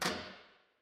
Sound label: door lock